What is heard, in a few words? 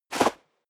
step high heels sand jump; schritte